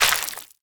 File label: meat beat